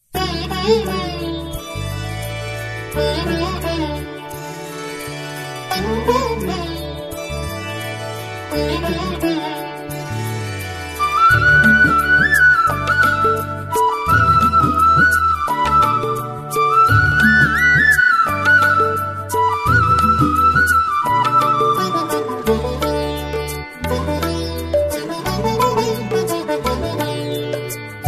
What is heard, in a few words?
music